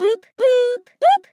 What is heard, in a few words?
Drown; Neru; SM64 CO-OP; Triple Baka Pack